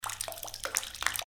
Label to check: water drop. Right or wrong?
Right